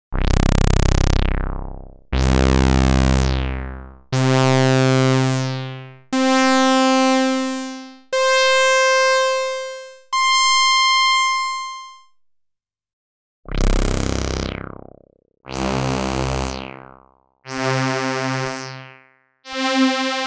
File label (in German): Klangvergleich LUSH-2 und MS-101